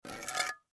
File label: Beer Can Pick Up, Beer Can Pick Up Scrape Full Closed; Foley; Objects; Blastwave